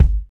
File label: hot kick g key, kick drum single shot tuned to the G note. Loudest frequency: 102Hz